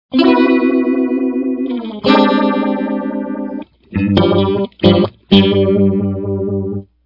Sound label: phaser; fast phaser